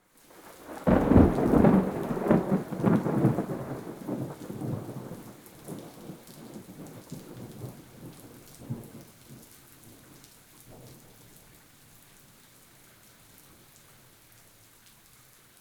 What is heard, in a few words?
Thunder Very Close Rain; InspectorJ - Essentials 01 Thunder